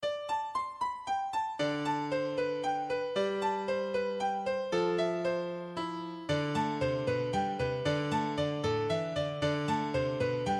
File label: Piano and Guitar; Accompaniment; Scarborough